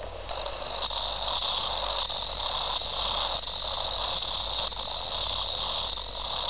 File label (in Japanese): suzumusi